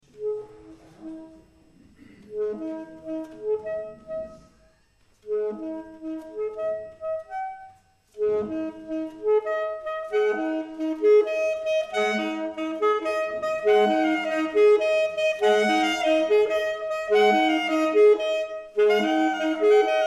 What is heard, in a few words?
sax; paul arma